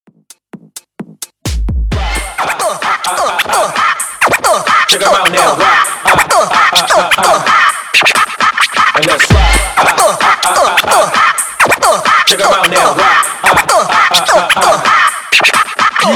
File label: Scratch Hype Intro Extended 130 BPM